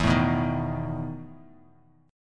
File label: E Major 7th Suspended 4th Bass Standard; EM7sus4 strummed